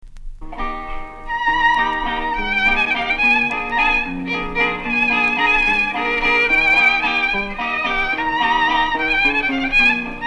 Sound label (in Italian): valzer di modena